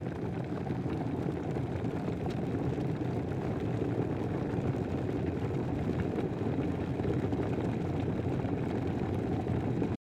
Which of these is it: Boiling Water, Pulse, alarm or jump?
Boiling Water